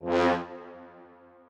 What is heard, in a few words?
strings